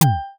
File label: cup place table; daily life